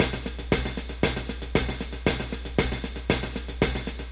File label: 116 hats, DRUM LOOPS and BREAKS; 111 to 120 bpm